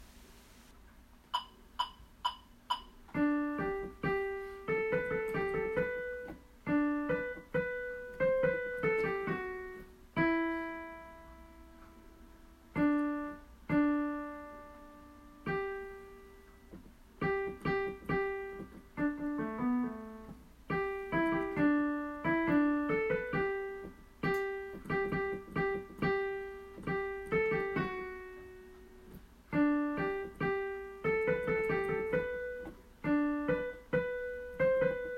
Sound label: Alto